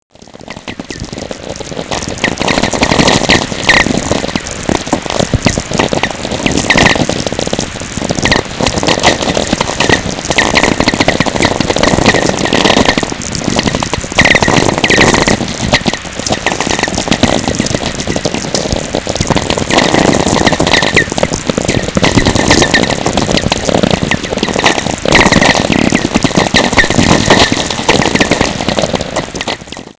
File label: tweeks; Natural VLF Radio Emissions